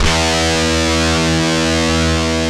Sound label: Dirty Lead, DISTORTION; Sound & Vision; Gigapack I CD 2; GUI E-DISTORTION